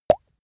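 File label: plop water drop, body sounds; soundpool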